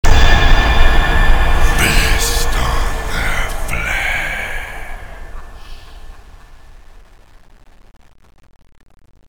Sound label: umb zombie win; csco; csgo; zbm3